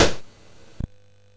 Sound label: sid MOS6581R4